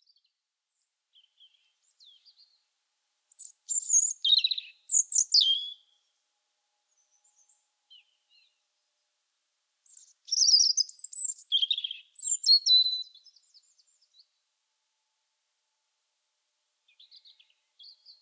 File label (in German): Vogel; Timo Tetschner; xeno-canto